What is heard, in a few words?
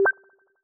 tell, notify; NovaSounds; NovaRealm